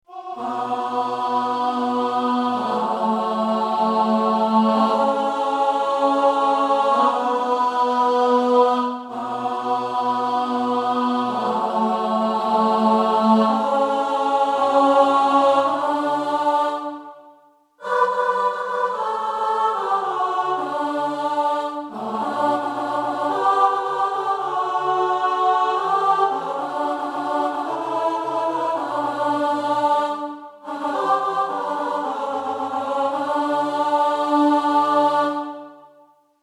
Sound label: Alto